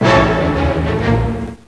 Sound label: CLASICAL